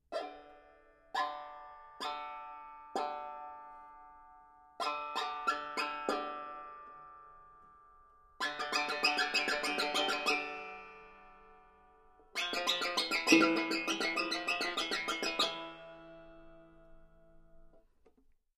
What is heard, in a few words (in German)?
kratzen, banjo